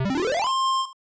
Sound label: Victory; Dragon Quest III